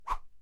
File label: woosh; experimentation table simulator